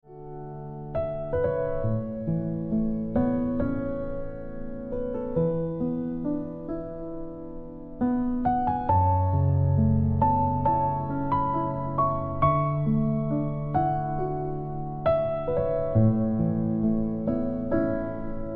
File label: Ringtone Piano Music